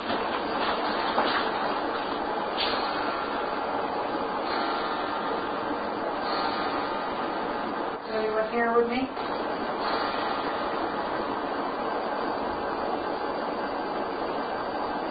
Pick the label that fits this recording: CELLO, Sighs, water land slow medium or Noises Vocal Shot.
Sighs